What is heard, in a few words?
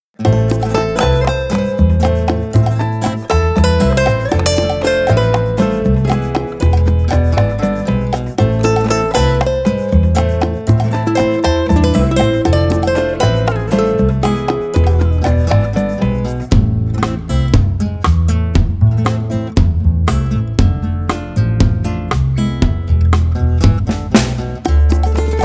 Rainforest Rhythm